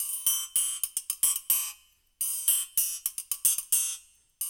DNCE, World Dance, Dance Coil; Spectrasonics; Supreme Beats

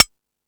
Beat Box; E-MU Pro-Cussion

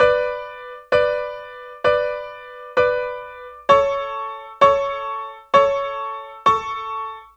KEYB, Hip-Hop & R&B; USB Soundscan vol.47; AKAI

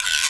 Guiro L, Percussion; MARKYDRUMS

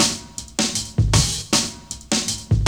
GRV, Guit It On

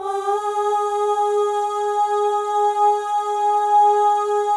OH, FEM, Symphony of Voices; Spectrasonics; FEM.1 OHS

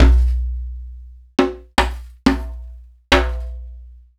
Akai MPC2500 Djambe

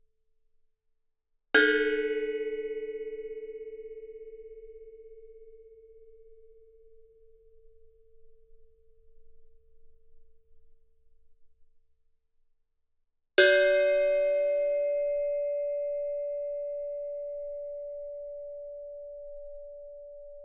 Gamelan; Propeller Island - Complete Gamelan

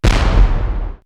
Big Bang, perc fx; X-Static Goldmine

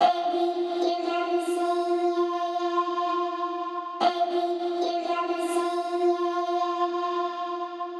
Stuttered Vox 120 BPM F min; Vocals; 99Sounds; Monosounds

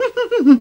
SILLY LAUGH

